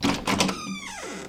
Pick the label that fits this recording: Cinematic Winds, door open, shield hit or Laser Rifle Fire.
door open